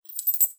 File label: Coins